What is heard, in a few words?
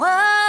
vocal